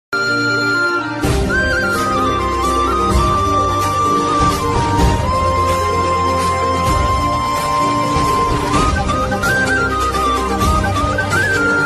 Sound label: tamil love ringtone love bgm ringtone